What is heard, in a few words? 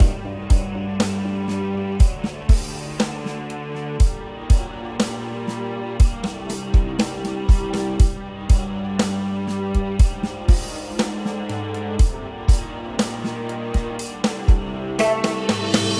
Tension Loop 120bpm 8bar